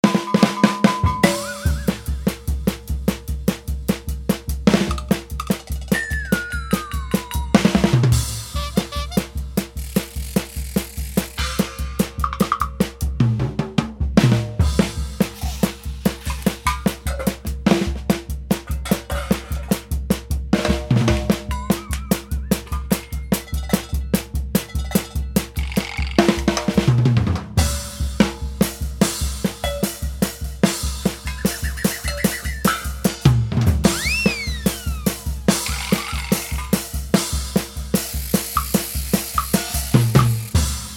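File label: funny drum roll with comic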